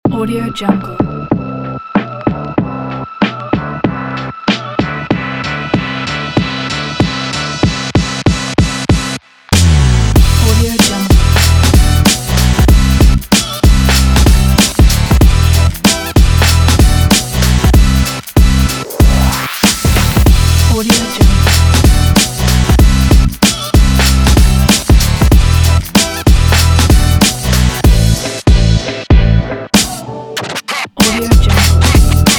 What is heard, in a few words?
hip hop